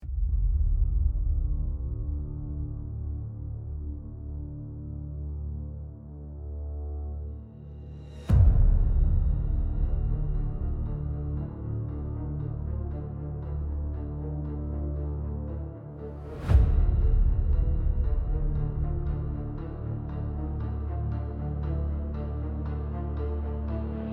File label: intense emotional motivational music